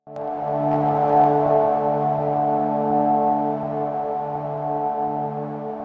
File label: D drone